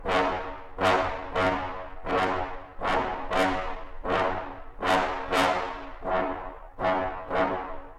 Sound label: String; The Bureau XCOM Declassified